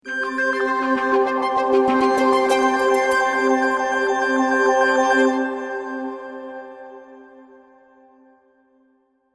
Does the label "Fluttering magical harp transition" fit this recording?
Yes